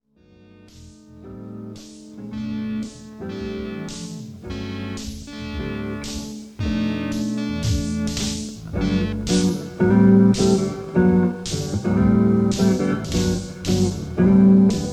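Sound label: short guitar swing part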